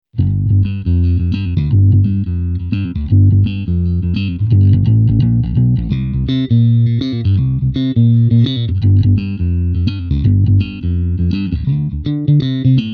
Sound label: superbass, amps; zoom b3